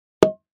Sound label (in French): bouchon champagne